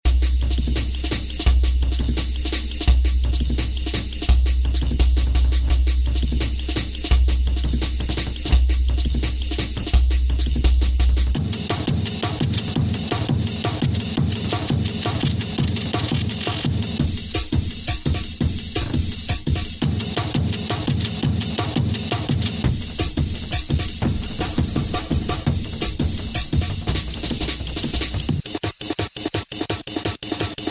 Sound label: Break Trailz 139-160